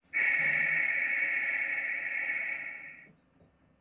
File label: slow release, Class 47; OpenBVE; Train